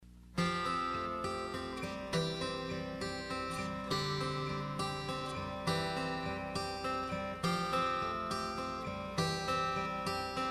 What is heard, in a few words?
Guitar